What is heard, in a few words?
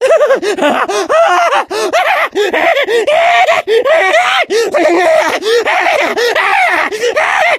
jockey attack loop, voice; Left 4 Dead 2